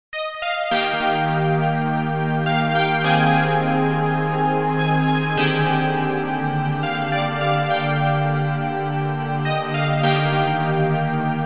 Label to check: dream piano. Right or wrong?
Right